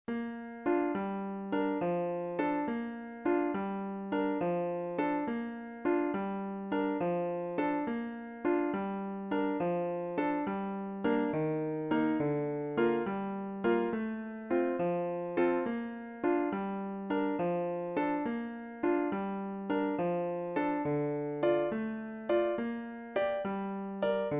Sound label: oboe sonata; saint-saens